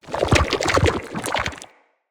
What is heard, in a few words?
Corrupted Slug Foley Move; Creatures; Movement